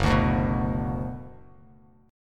F sharp Suspended 4th Sharp 5th Bass Standard, chord; strummed; JGuitar